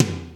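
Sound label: processed tom, drums; Crypton - DrumBase5000; PROC.DRUMS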